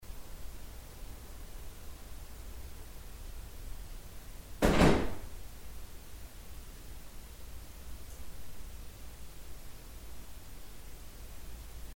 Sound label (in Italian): colpo vicino microfono; Stanza Gregorio II pre indagine